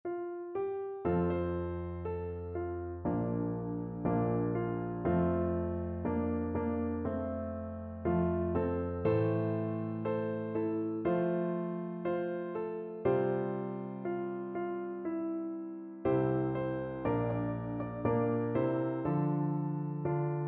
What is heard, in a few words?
theres a man taking names piano